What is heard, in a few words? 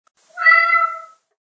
A cat meows.; Cat Sound Effects; Sound Effects:Animals